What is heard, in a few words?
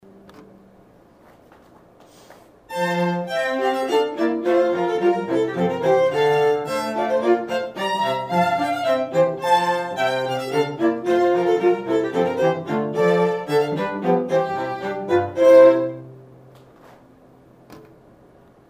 Water Music Trio